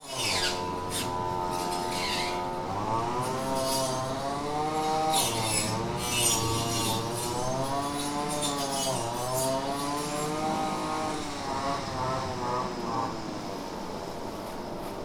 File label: decontamination